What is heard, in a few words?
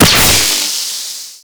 Beam Fire; Super Moa Beam